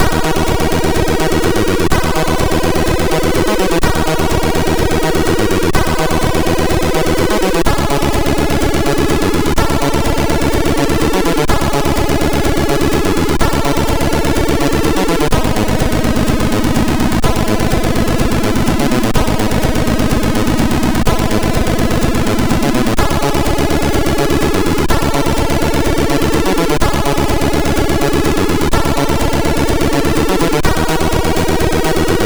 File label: Techno, sid MOS6581R4; Techno 2 second